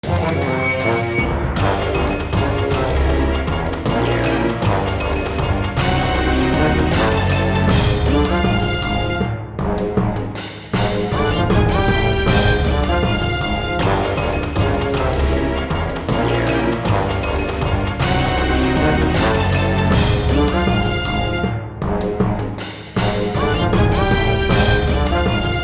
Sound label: Coin Beam Cacophony